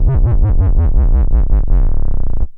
Bass Lick, Drum & Bass; Fluid Dynamics

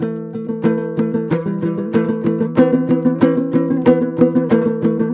TOPSHUR; Soundscan vol.60; Asian Traditions; AKAI

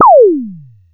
TOM KIT A4, TOM SYNTH; USB Soundscan vol.20 - Fresh Disco House I

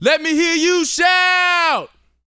VOCAL, R&B Dance, HIP-HOP

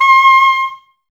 OBOES C, Woodwind; Wood Winds; Oboes Links; Miroslav Vitous; Woodwind Brass Ensemble

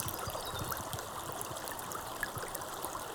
Waterway G Dark

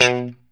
POP B 2, STRAT guitar

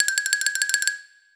Xylophone; Orch Perc and Stings; cartoon; musicradar; cartoon caper

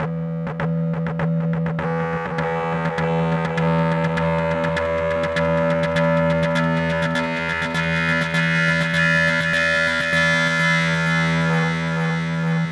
synth, Hardcore Breakbeat; Big Fish Audio; Hardcore Breakbeat Action; Rhythms 4 Life 151 Ebmin

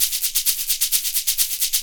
hihat 2, 130, drum loops

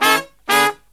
Killer Brass Riffs

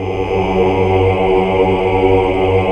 AAH AAAH Uni; Sound & Vision - Gigapack II CD 1 (Roland)

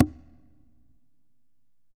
Congas wet, drums percussion

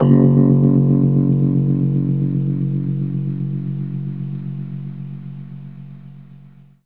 F#, Rhodes, Keys; 3D Sound; 3D Keys VOL-1

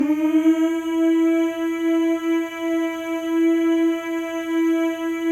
male; Spectrasonics; Symphony of Voices

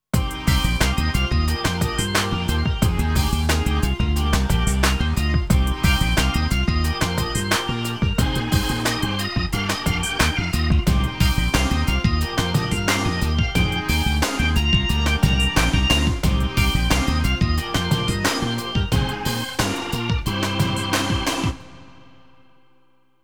B3 Organ; Masterbits CLIMAX 8

